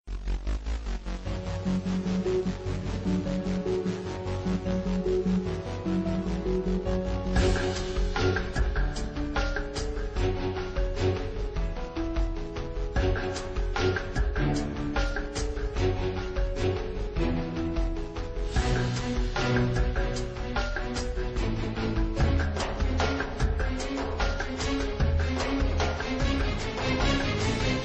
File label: Angry Tune